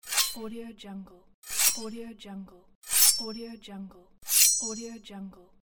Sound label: medieval weapon sharpening